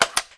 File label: pkm belt remove; firearms